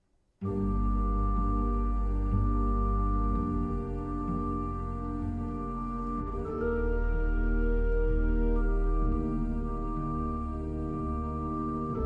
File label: Adagio in G Minor For Solo Violin, Organ